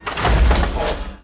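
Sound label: crash of books that fell from a shelf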